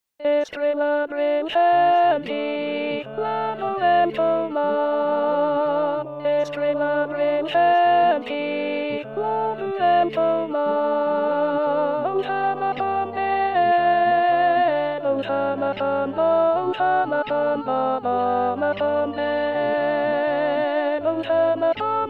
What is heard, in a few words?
alto